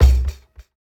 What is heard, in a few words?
Kick Hat Combo Clatter